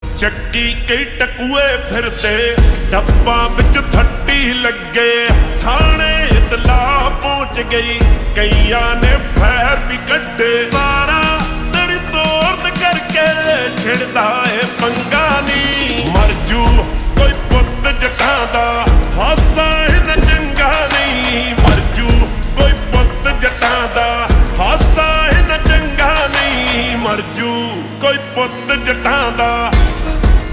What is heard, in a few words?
Slow Reverb Version Ringtone